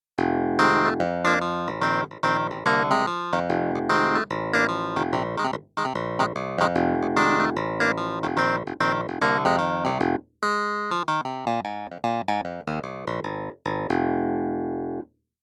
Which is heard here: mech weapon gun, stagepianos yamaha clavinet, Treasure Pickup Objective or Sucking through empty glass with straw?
stagepianos yamaha clavinet